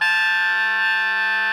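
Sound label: SOPRANO A 4, Saxophones, SOPRANO GROWL; GRWL; Greytsounds; Philip Wolfe David Crozier's Saxophones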